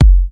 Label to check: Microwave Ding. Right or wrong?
Wrong